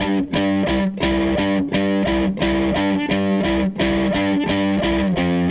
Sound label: Tele Guitar Loop